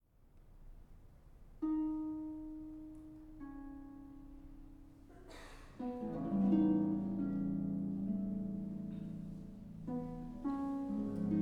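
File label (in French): Sonatine- 2 Calme et expressif, solo piano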